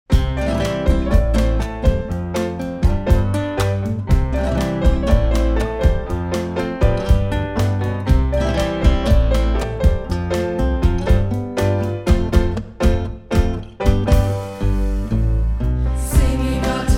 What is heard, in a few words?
Singing all together; Einspielung des engl. Liedes